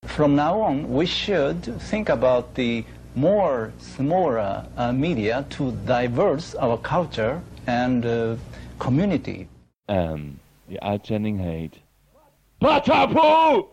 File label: jingle; patapoe; tetsuo